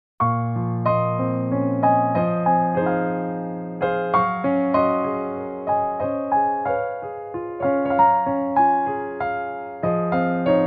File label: piano